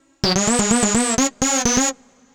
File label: Android Riff, Funk; Dr. Fink's Funk Factory